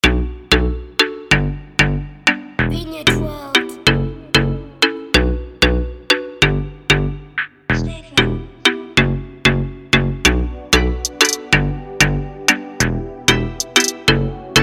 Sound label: Afro Free Beat